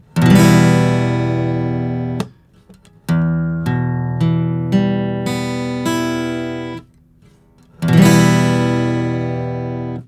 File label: Major or Minor